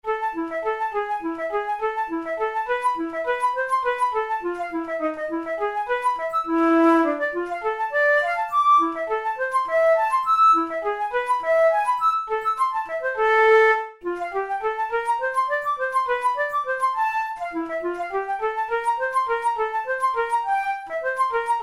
studies for the boehm flute; drouet 72

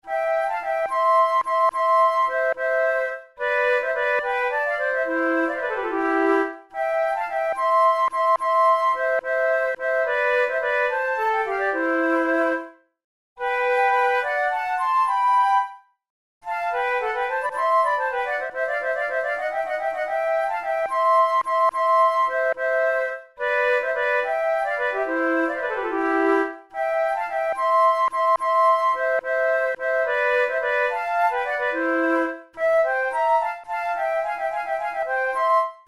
duet

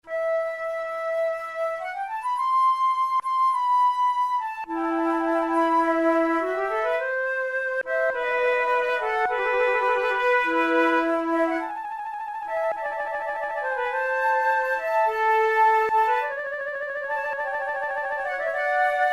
duet grave, flute; quantz

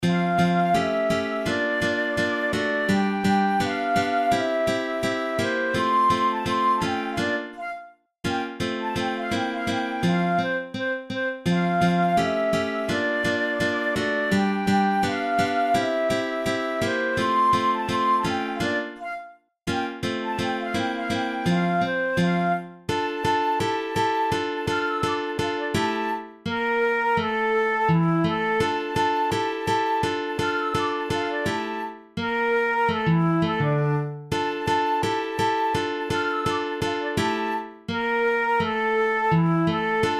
straight jig, flute

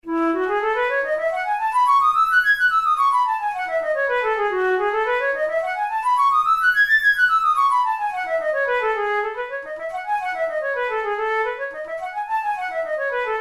melodic exercises, flute tunes

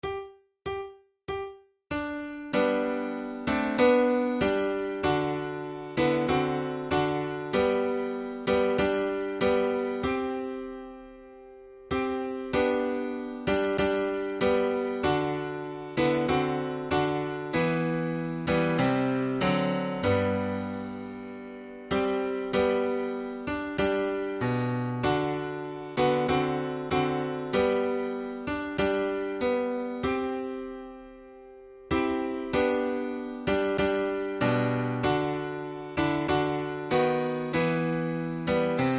auld lang syne, flute

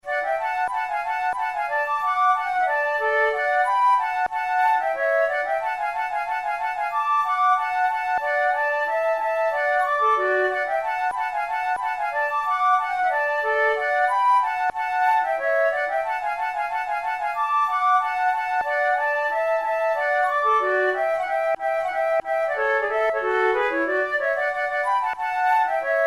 duet

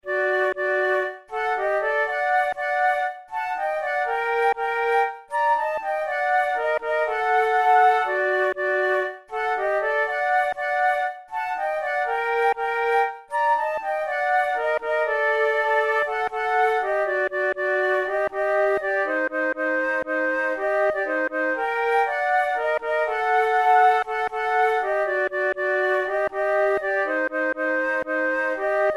duet, flute

